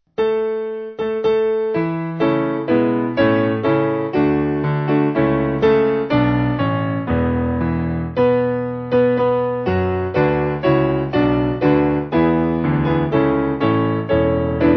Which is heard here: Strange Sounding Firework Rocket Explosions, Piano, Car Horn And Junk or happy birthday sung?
Piano